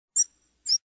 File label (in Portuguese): canto bicudo